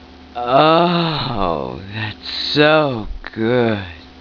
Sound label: moan